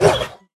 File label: Hunting Dog Death; Linirea